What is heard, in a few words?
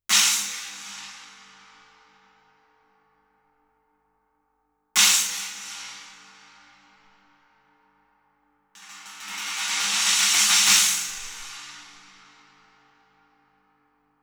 Cymb China; 90 Degrees From Vertical